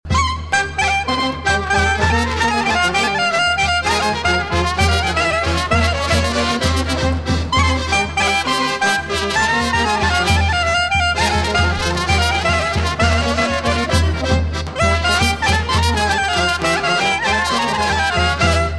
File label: Brass Band